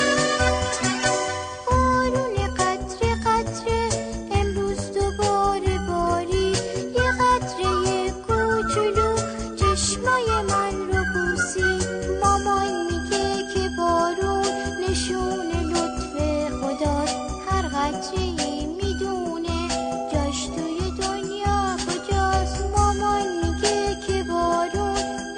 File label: Rain